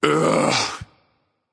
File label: "ugh"; smite; voicepack; poseidon